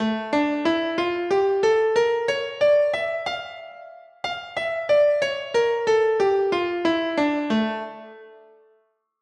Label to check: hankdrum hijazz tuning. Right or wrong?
Right